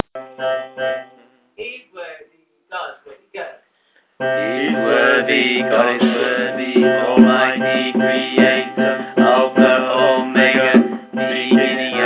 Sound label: He Is Worthy Bass